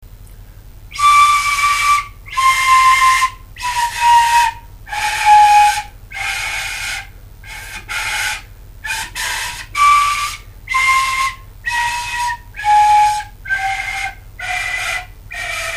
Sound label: flute